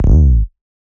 Slide E, Bass

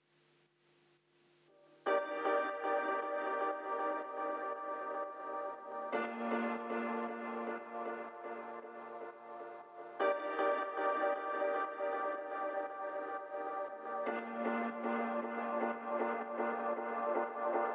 118 BPM G Min Key Space Echo Layer; Melody Loops; Loop Stems; Cymatics; Pacha

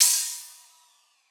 ride, cymbals, drum one shots

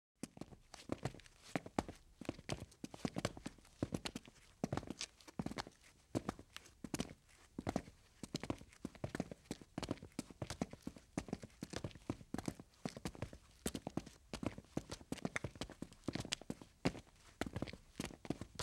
Footsteps Concrete; Fairlight Sound Lib

